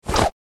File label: Vampire supernatural speed movement